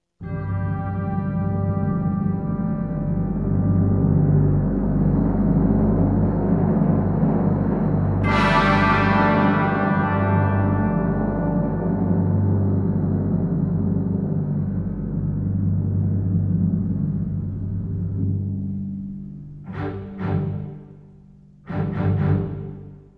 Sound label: timpani